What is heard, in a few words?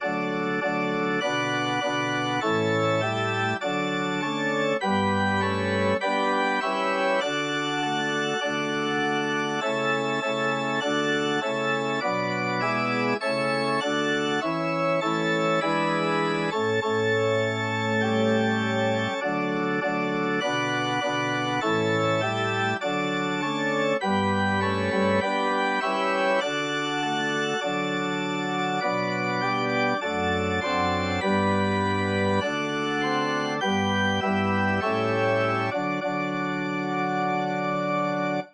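holy holy holy, organ; piano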